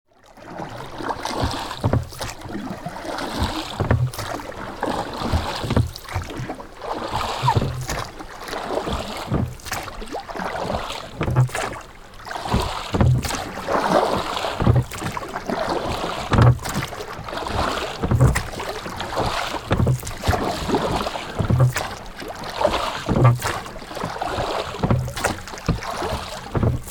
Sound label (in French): Bateau à rames, Transports